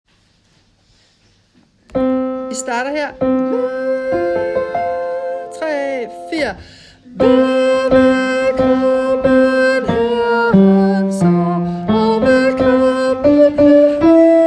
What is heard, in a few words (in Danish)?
tenor